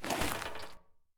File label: prone metal; Escape from Tarkov